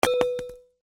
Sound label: metal tool drop carpet